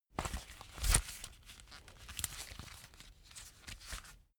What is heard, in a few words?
leather belt close heavy